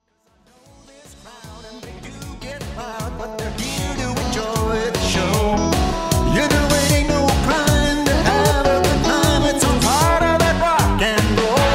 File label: Good Ol' Rock'n'Roll